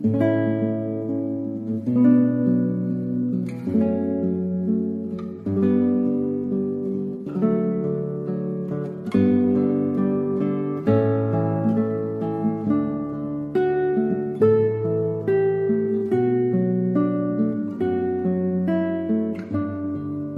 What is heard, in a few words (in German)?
einige gitarrentakte